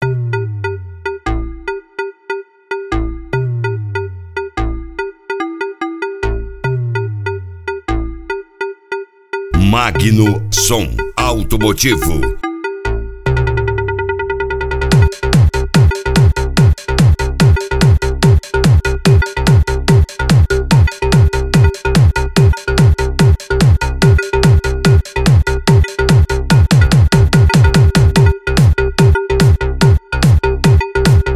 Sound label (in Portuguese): som automotivo; gauchinho som e acessorios e magno